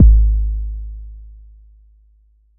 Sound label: Bass Kick, Jomox Airbase99